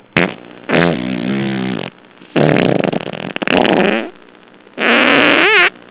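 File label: full octave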